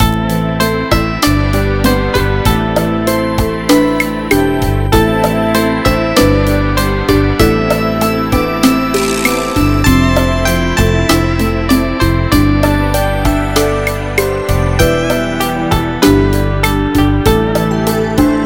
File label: Background MIDI